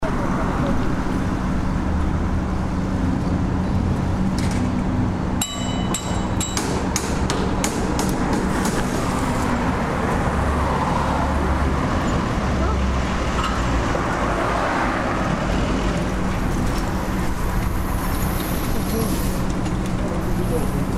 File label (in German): strasse baustellenlaerm